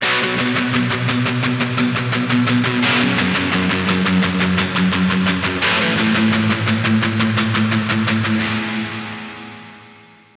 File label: Str G#